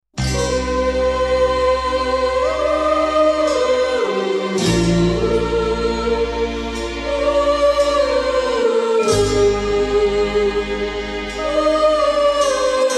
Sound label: sad female